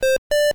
Square Motif

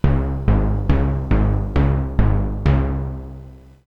Timp Ending

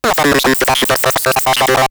Mechanical

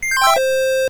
Square Motif

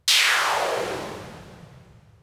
Airshot

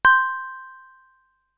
Toy Chime

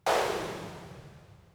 Airshot

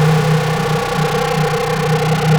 Laser Loop

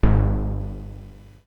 Timp

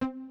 Pizzicato

